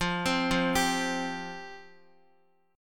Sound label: F Suspended 2nd F Guitar; arpeggiated; x,x,5,5,5,3; JGuitar